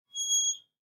Metal Stool Small, Metal Stool Small Artist Spin Squeak High Pitch Short; Chair; Foley; Chairs; Blastwave